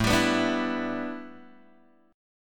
A 7th Suspended 2nd Suspended 4th A, Guitar Open G; chord